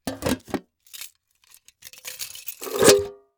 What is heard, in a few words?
Remove Lamp Cover; yesterday origins gamerip 2016 switch ps4 pc ios macos